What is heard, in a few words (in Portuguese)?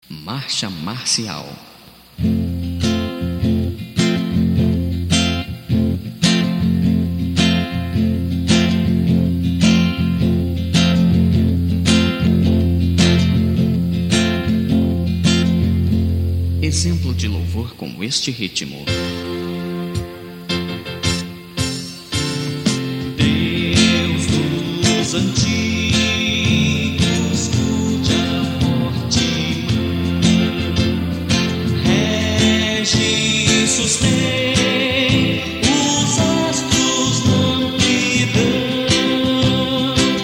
MARCHA MARCIAL, ritmos de violão